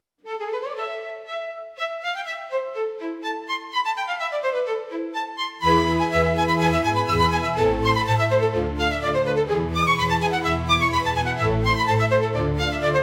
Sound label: happiness instrumental